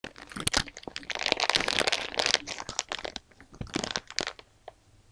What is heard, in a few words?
kick